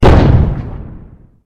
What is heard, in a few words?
impact; slender; guardian